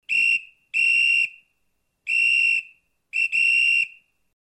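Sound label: police whistle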